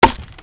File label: wood; Mafie 1